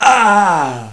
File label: pain; JOSEPHIN